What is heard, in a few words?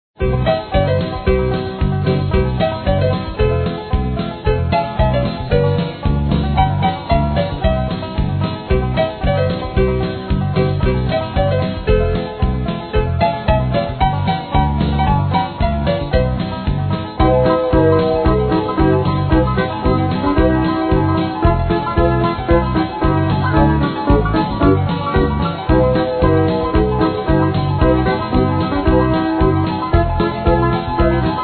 jingle bell christmas ringtone